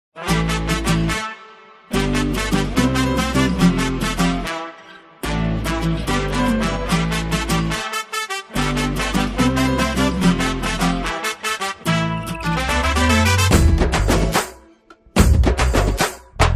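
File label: background music Ringtone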